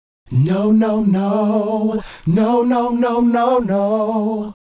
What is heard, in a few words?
Male Group, Hip-Hop and RnB Vocals